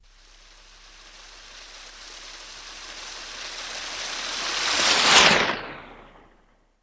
Water By Doppler

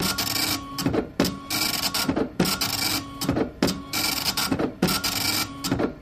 Dot Matrix Printer Loop Printing

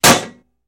Tin Can Impacts Dull; pots & pans

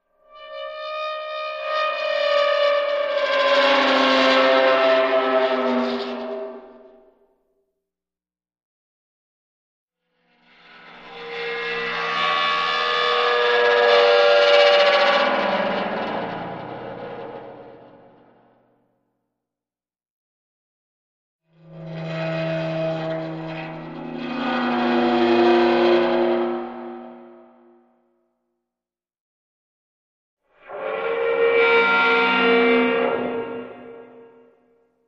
Dry Ice Eerie Gratin

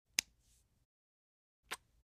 Handshake, Skin Rub With Light Kiss At Tail

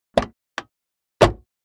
Car Door OC Foreign

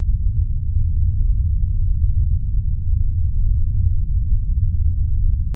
Earth Rumble Loop, earthquakes

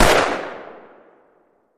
Gun Shot Sngl Fire

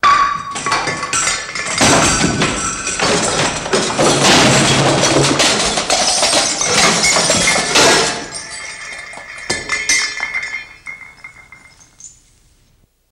Debris Misc Falling, ransack room

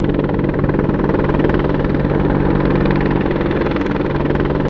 Apache Blade Whops

